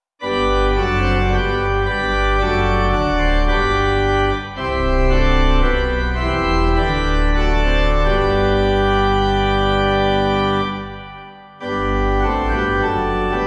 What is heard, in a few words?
Hymn organ dix; Hymnal 1982